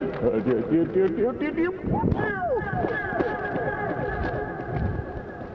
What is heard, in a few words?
peow